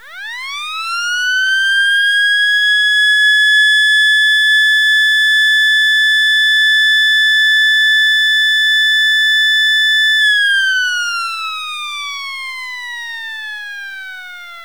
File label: Siren; AX: Explosion-Proof General Alarm Siren